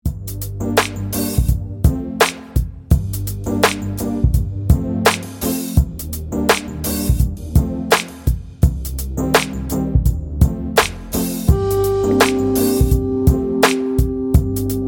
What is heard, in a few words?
electro lounge